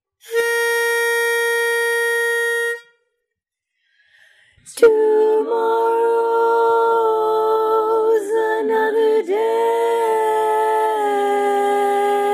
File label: Tenor dominant